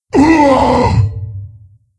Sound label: Wound Crit, Creature; Steelbreaker